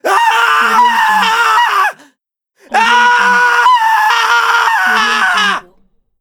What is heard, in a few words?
male screams